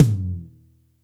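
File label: TOM soft; Zero-G; Chemical Beats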